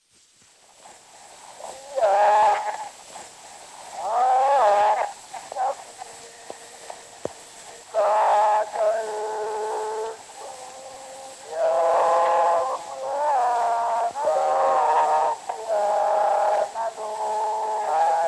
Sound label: Zulu Recordings; Father Franz Mayr; Lomtshado